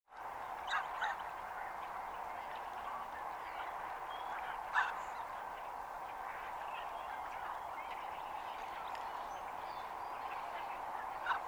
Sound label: Canvasback Aythya valisineria